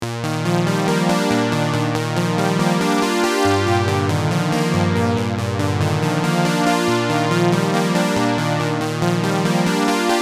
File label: SDD320 Chorus